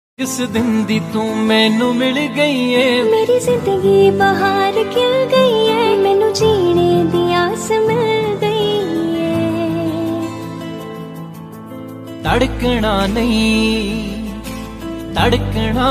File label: Dance Punjabi Ringtone